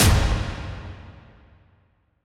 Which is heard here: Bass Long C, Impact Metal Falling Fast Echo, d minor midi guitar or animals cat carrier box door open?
Impact Metal Falling Fast Echo